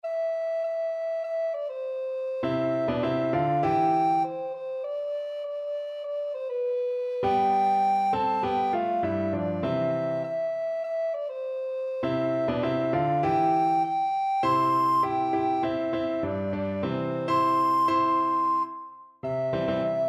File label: brown girl, alto recorder